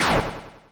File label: swing; ralsei